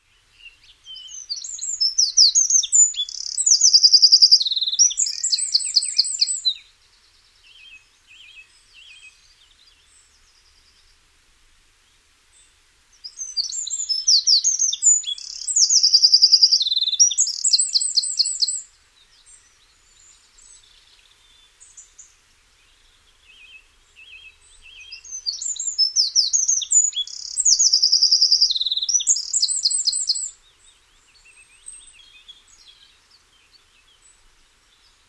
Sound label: wren